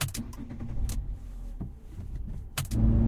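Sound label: quiet smooth working engine start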